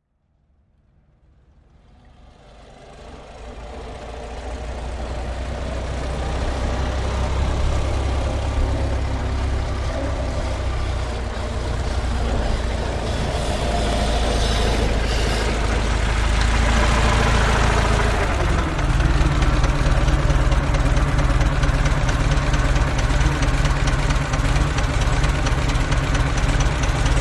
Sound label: Model T Stop Off, ford model t